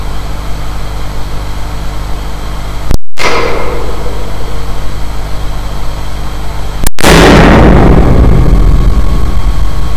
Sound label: beam On Ted Coll